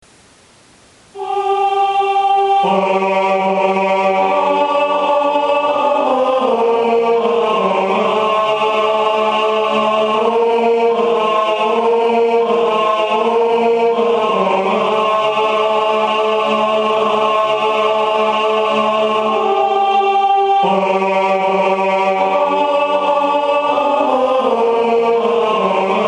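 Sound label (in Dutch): Haec est clara dies TENOR, klankopname (digitaal); sintjanskoor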